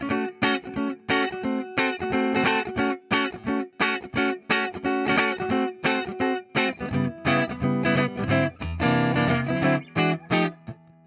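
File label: jazzmaster clean; fender classic player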